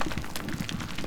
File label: Footstep; FMOD Project; CaiStuff; Player